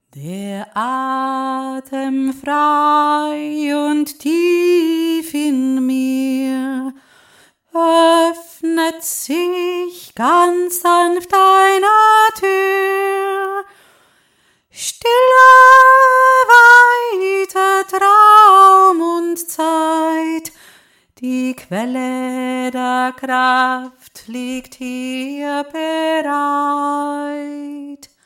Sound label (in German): der atem frei bungslied